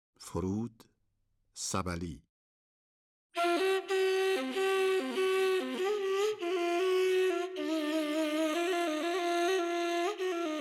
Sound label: Forood Sabali Dashti Radif-e Ney; Avaz-e Dashti